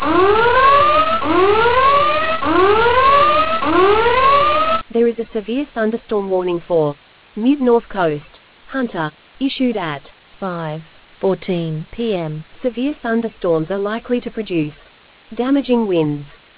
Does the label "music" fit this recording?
No